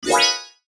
chime bright, Chime Bright Musical Ascend; Production Element Imaging Element Accent Transition; Multimedia; Chimes; Blastwave

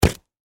Mud Suction, Impact, Mud, Impact, Suction; BlastWave

